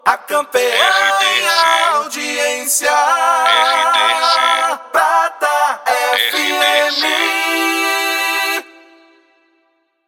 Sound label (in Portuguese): acapela